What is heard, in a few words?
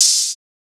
Open Hihat; Drum One Shots; Cymbals